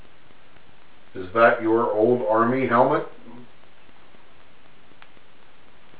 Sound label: mmhmm